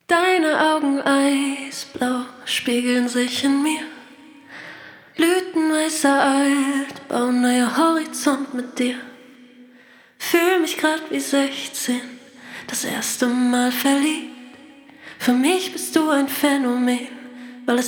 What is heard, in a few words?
Vocal Reverb Back and Side Walls Mid and Side Filling